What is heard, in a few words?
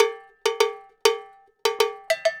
Cow Bell, Latin Percussion